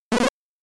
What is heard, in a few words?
Player Smash; Metro Cross arcade